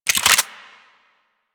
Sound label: Burst Pistol Clip In; Warframe; DE; Weapons